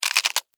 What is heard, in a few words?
Insect, Eat, Chew, Bite, Bug; Insects Eating; Blastwave; Animals:Insects